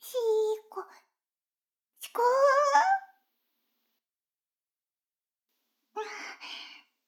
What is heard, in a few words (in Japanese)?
シコシコ音声; 逆時間停止されて